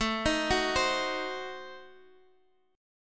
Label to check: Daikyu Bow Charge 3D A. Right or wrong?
Wrong